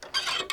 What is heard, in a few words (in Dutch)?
deur squiek; sound effect; Home-made; Thuis